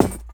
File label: BD, 150 BPM; Ueberschall; Drum & Bass Resonance